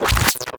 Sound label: Beam Impact Generic; Warframe